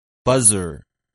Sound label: buzzer